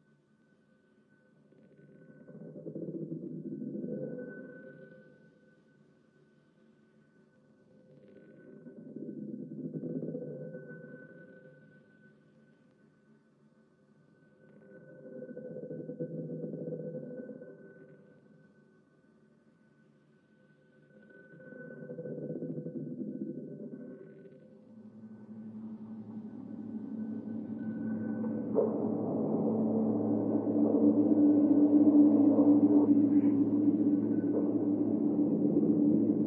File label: Retardation 148 BPM; SIGNAL2NOISE RATIO